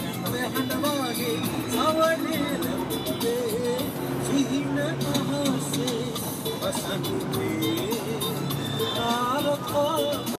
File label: bus party